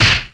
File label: punch